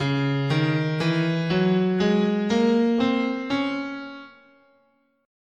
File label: C sharp Melodic Minor, scale